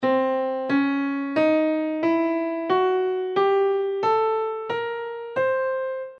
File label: half whole diminished scale